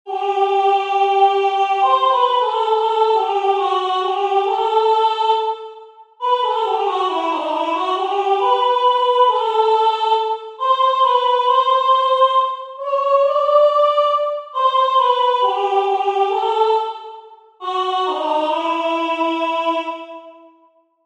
entonacion piano Voice

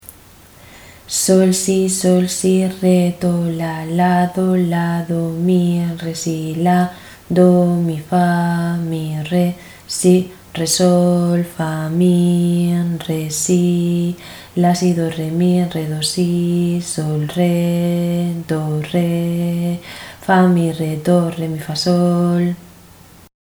ritmo 6-4

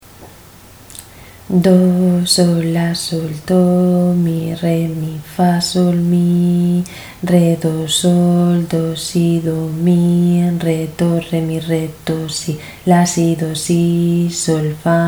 ritmo 6-4

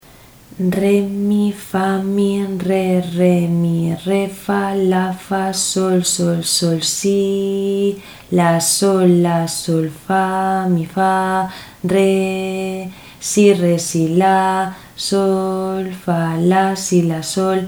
ritmo 6-4

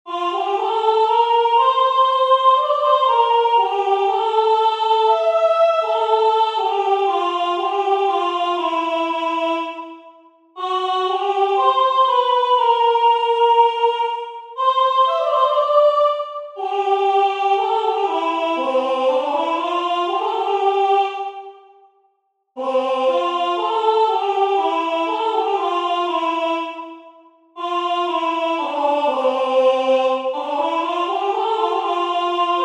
entonacion piano Voice; Entoación con acompañamento; Exercicio 3; Só voz

